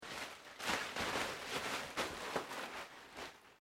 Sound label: PLASTIC SHEET SCREWED UP; Material components for constructing more complex sounds